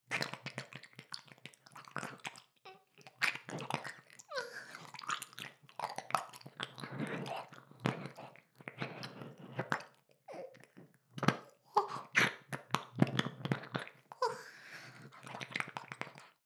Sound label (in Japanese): 耳舐め音声, ASMR; ダメなメイド